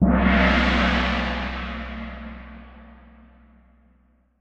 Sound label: Jingle Boss Appear; Dandy Dungeon Legend of Brave Yamada